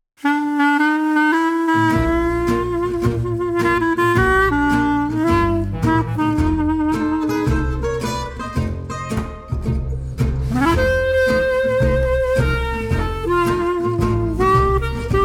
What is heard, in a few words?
Bluesy